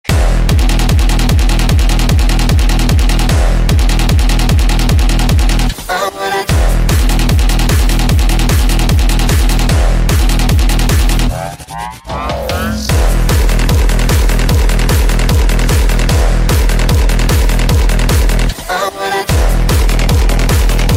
Hardstyle

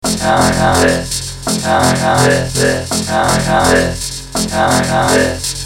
Trance

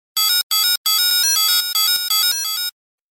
Mixed Phone

